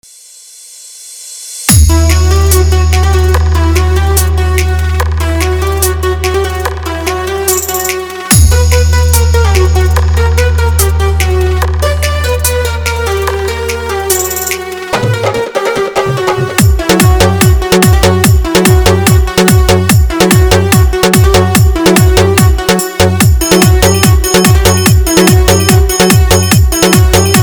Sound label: Edm Song Hard Vibration; Comptition; Tahalka